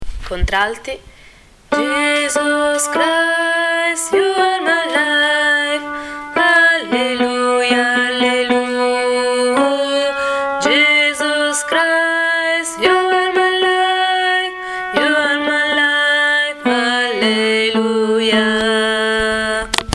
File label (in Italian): contralti